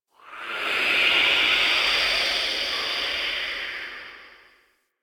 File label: interior cave wind howls; Ambience; Misc Indoor; DE; Warframe; Infested Eris